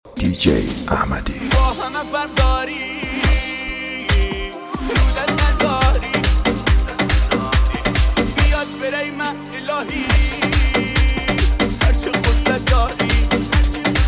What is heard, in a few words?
Remix